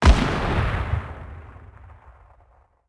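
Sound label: zenitka shot, anti-aircraft gun shot; World of Tanks Blitz; ambient neptune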